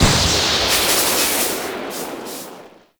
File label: Lightning Machine Strike; Warframe; Ambience; Entrati